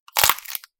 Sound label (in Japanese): 骨が折れる音